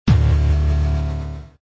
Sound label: Level End; pencak silat 2 2002 gamerip online